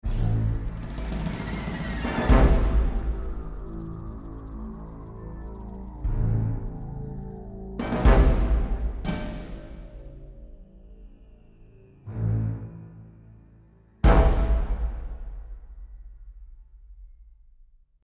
Tension; Face of Mankind; windows gamerip 2006

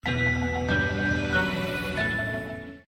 Battalionbattle Lose; Mist Train Girls

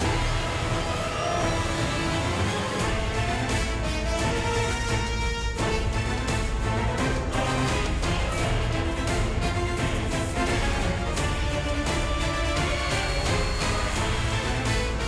Battle Boss Snow

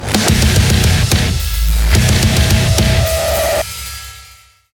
transition; Doom

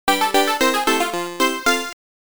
Round clear music; Hopping Mappy

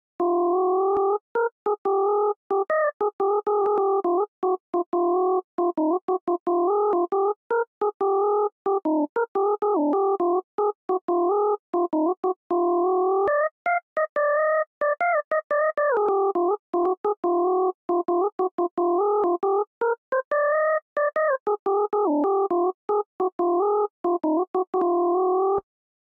Melody; chippy

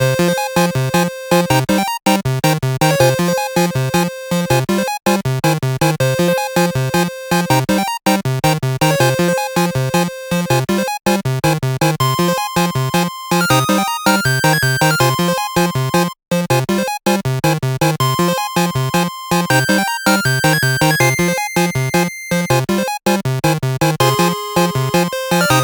Castle Music; Bebop and Tempo; MacOS Windows gamerip 2017; Area 2

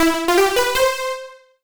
MUSIC EFFECT Solo Warm Synth Positive (stereo); startide macos switch windows gamerip 2017

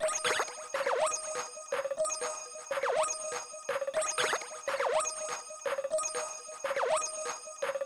Fever Time Telephone Diner; popslinger; ps4 ps5 switch windows xbox one xbox series xs

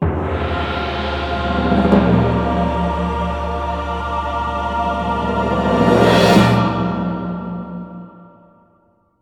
Bigwin; Stronghold Definitive Edition

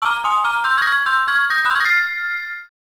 Round Cleared; Emeraldia; arcade

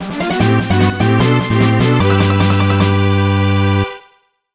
Item Fanfare; Cave Story; SNES Soundtrack Mod

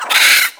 mole hit; birthday party bash; wii; water balloon

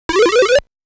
Smoke Screen Attack; Pac & Pal

